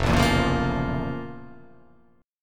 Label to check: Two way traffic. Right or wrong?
Wrong